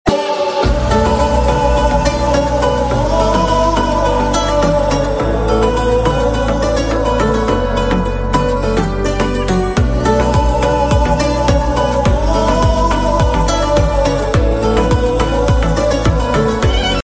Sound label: instrumental ringtone